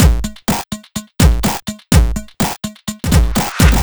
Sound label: 125 Full, Drum Loops 125bpm; Zero-G; Soundclash Nu Dance Electro; Acidized Wavs; Sticky Icky